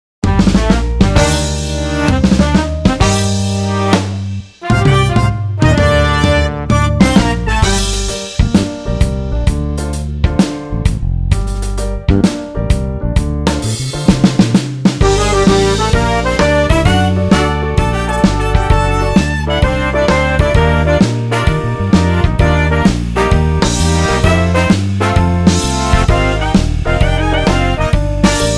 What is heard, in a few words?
Big Band Orchestration